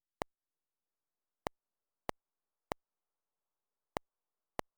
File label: click processed